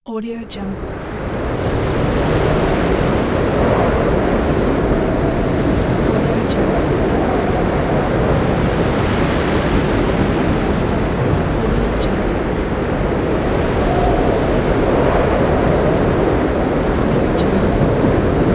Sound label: wind ambience